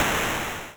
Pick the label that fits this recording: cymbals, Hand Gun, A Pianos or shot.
shot